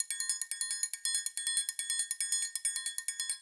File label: 140 Dance Triangle; Dance DJ; Percussion; BellCowbellAgogo; Pro Tools Essential Loops; Digidesign